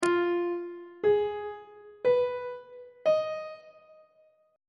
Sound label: g sharp dorian 7th chord 6 is e sharp half diminished 7th on treble clef